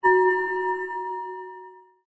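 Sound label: notification message; Stellaris; ringtone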